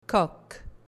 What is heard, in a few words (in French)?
coq